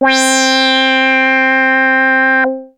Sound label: Arp A C4, ARP Odyssey synth; musicradar-synth-samples